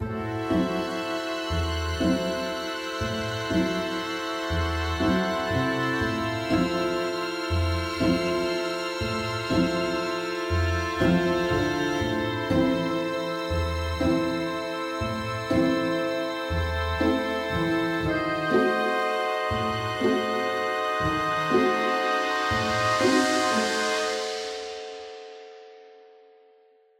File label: Workshop Pizzicato; tintin reporter cigars of the pharaoh